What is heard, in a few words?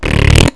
squash